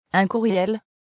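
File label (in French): Un Courriel, fichier de notification; samsung s7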